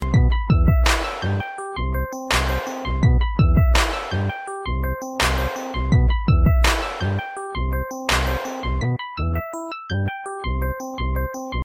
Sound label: Hip Hop Ringtone